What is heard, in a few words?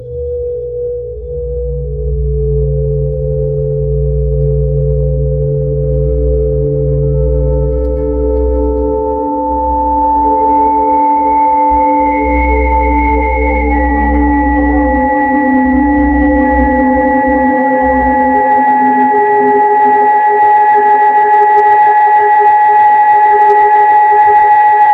ambient meditation